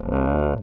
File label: Voice, beast or animal